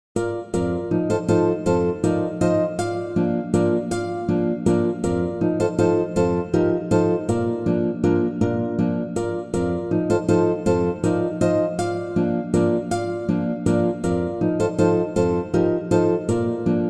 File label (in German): Guitarren Walzer; Light2Art